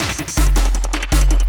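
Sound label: loop snare drum, 160 BPM, Drum & Bass; Ueberschall; Drum & Bass Resonance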